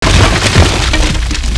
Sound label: Mine Fence